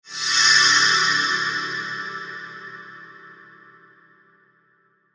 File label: Logo Tonal Whoosh Reverb; Blastwave